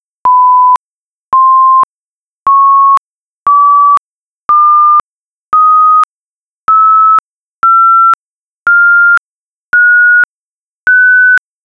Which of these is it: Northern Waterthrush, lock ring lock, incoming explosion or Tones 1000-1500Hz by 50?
Tones 1000-1500Hz by 50